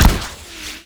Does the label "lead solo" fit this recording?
No